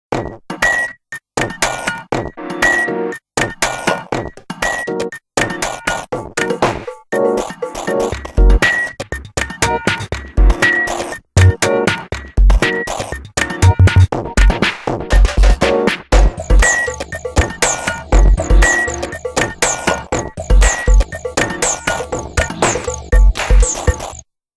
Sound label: Robot, Nokia Tones; Windows Phone apps